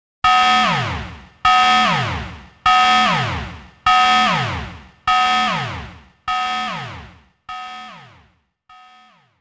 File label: Warning; Cyber Troopers Virtual On